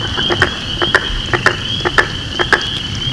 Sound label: Rana virgatipes